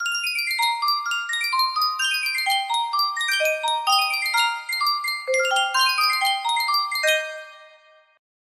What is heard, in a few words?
Sankyo miniature music box